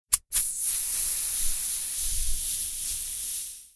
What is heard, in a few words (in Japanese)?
kuuki